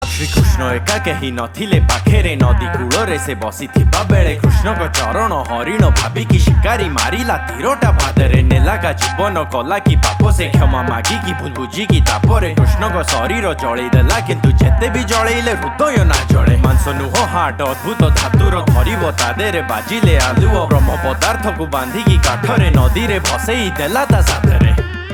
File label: krishna rap